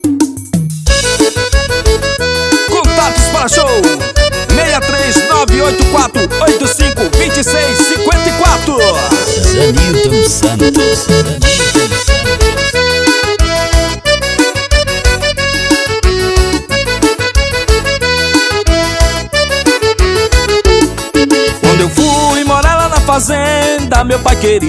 forro das antigas

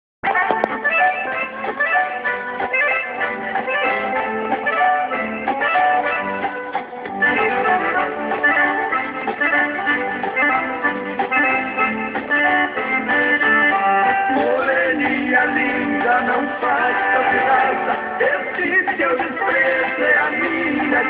gauchesco ao vivo

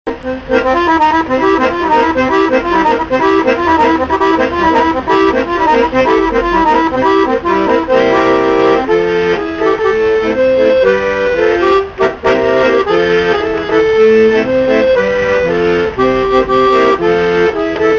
acordeon

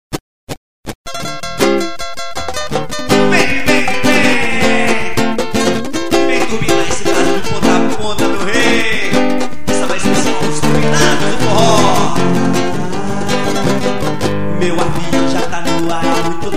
forro turbinado

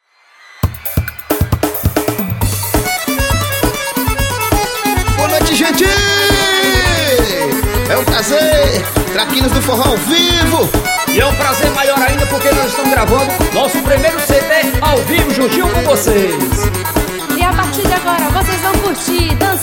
forro abertura; traninos do forro

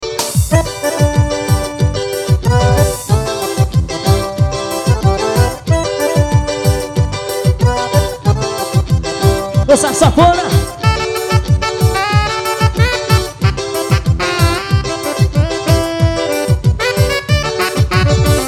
forro ao vivo; rm gravacoes

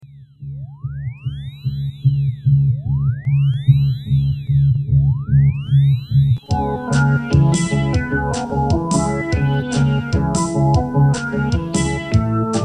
tecno lógico instrumental

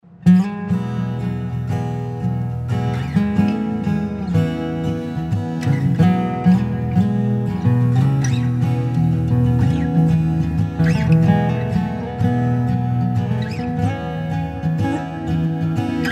brisa violão instrumental; alexandrecunha

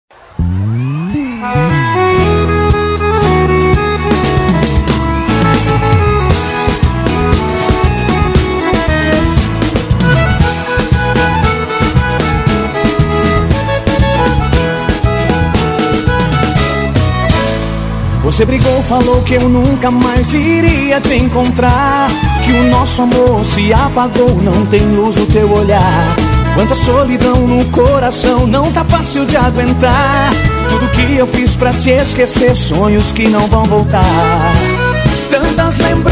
viola

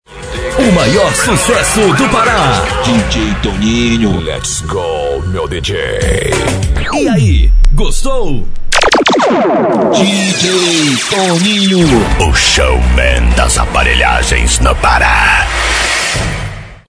vinheta mini abertura

